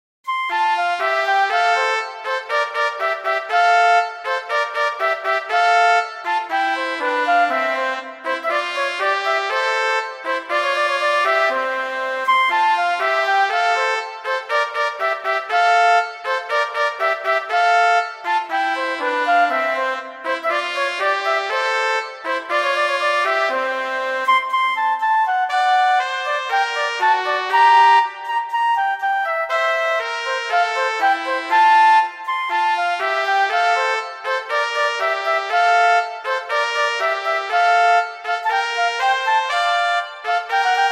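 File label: Duet, violin, viola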